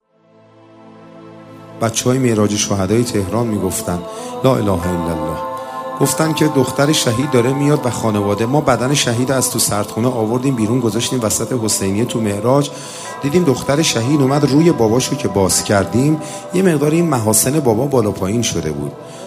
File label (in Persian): نواهنگ